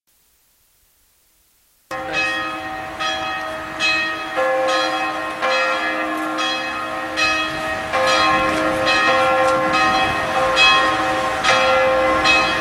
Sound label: ChurchBells